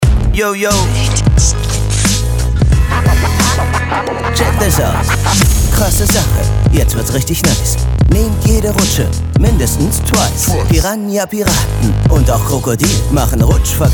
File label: rap